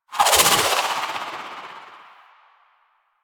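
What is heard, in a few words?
Charged Projectile, Sidearm; Weapons; Warframe; Tenno; Wraith Sidearm; Epitaph Prime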